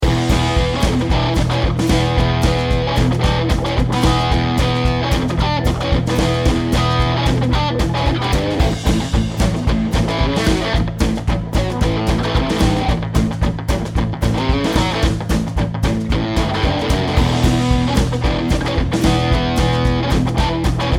Crunch Boost

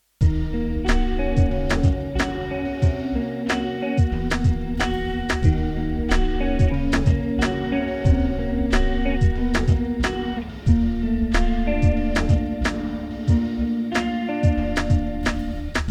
TripHop

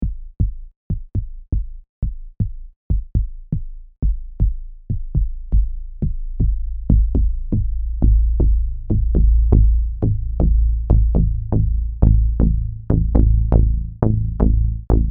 MiniMoog Bass Filter

